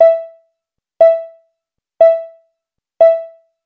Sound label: beep; RustAdminRelease